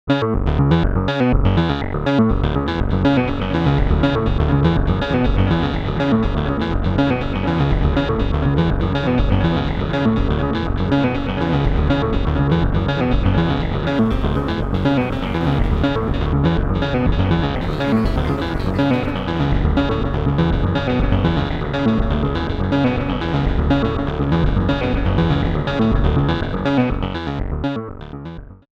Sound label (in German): Effekt Delay